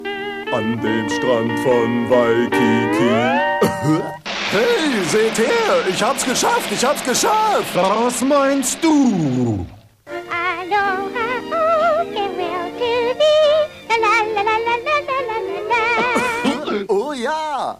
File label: hawaiian